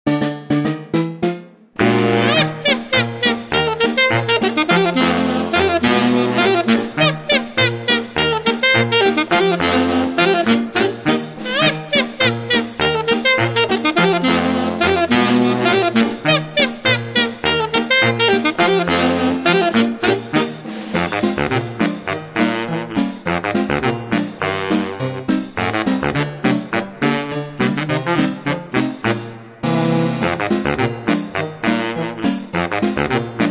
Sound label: Laughing Sax